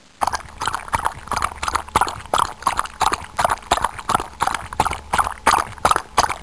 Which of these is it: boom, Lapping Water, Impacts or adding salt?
Lapping Water